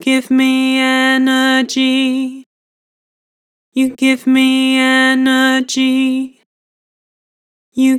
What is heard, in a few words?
Vibrato Give me energy, Harmor Vocals